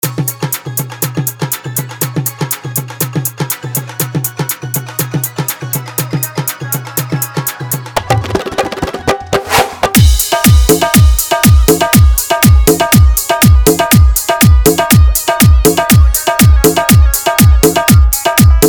hard bass dhollki mix

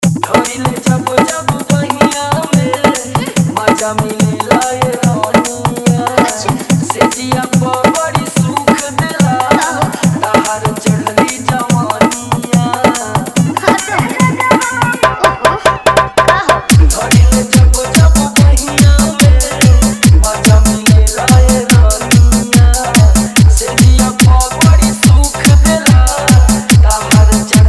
Dj Song, Bhojpuri Hard Bass Mix

